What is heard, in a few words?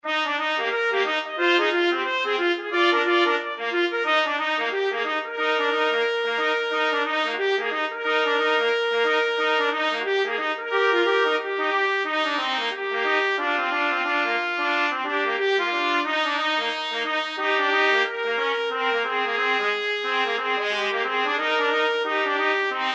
when the saints, trumpet duet